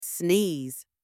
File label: sneeze; body parts vocabulary